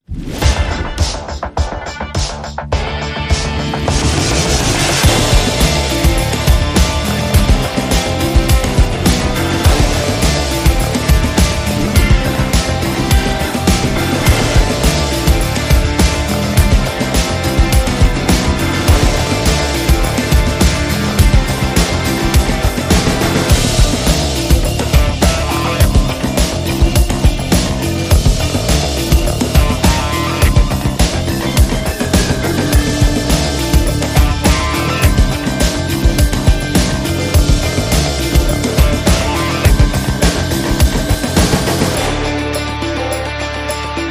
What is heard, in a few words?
No Brass